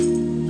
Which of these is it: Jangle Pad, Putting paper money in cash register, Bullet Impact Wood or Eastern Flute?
Jangle Pad